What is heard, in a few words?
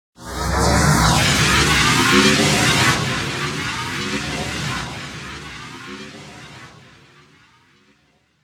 Powerup; Hilzartov; Fairy Size Doesn't Matter